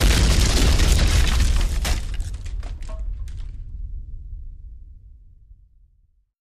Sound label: Explosion; Wood Debris; wood & sand